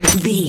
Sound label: bullet impact glass